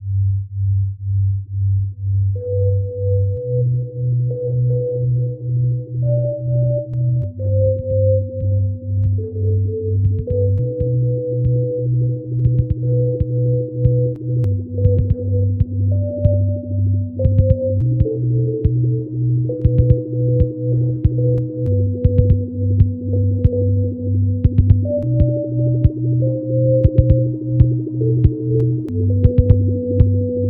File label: Signwave